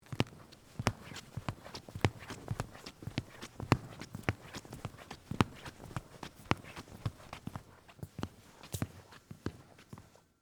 Stage Floor Walk, Footsteps, Stage, Floor, Walk, Across, Wood, Surface, Theatre